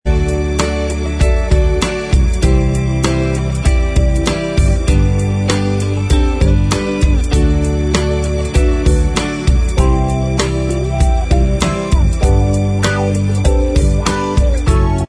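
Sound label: instrumental, backing tracks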